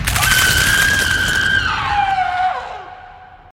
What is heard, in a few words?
Scream; Ramiel